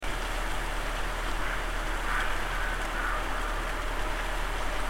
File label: mystery call